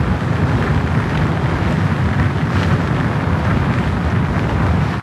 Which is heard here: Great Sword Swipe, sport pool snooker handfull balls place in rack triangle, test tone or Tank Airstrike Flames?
Tank Airstrike Flames